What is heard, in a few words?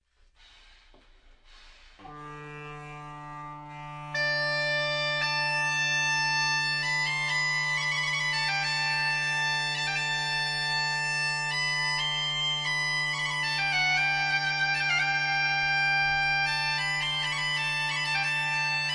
cantiga bagpipe; Early Music; Virgen Madre gloriosa; Cantigas, Cansos y Dansos; Amherst Early Music Festival July 2007